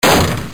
Hit Door

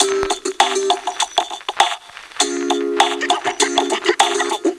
Hiphop Intro; Imagine Modern Dancer; Nintendo DS

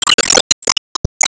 Ufoblock Divide Landing; Se Enemy

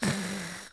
Snort; Fifi; Neighbours From Hell Console Edition; GC, Xbox

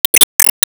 Player Pain Poison; disney star smash android ios mobile gamerip 2020

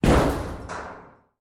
Collision Minimal; Lethal Company

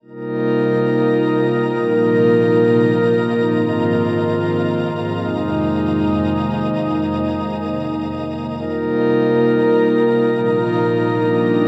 Song Timestream

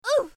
Bumping

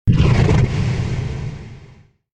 Stomp; Satan

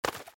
Monsters Bird flap

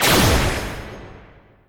Enemy Bossegg Divide

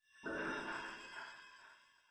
Metal Creak; Lethal Company

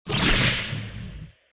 Pillgoodeffect

